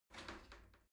shower slide door slide open soft

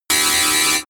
tile cutter synthetic

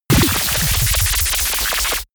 bubble buzz shot; laser

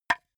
wood board clap hard; objekte; holz

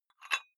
dumbbell take off very small weight gentle; sport freizeit; fitnessstudio

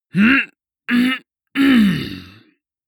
male harrumph angry; menschen; koerpergeraeusche; soundpool

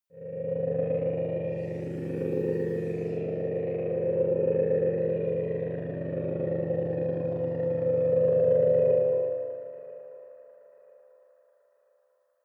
dark temple, horror

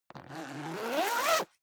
zipper down jacket open accelerate; objekte; reissverschluss

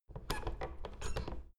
door plastic handle use creak soft

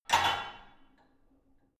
barbell only replace hard; sport freizeit; fitnessstudio

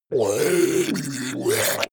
zombie grunt choke male wet; kreaturen

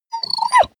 dog whine trill; natur-tiere; hunde

